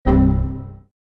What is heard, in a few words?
windows xp critical stop